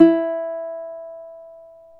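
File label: reference pitch uke std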